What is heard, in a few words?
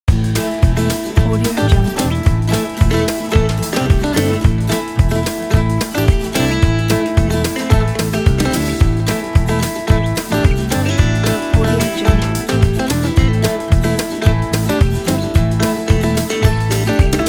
country blues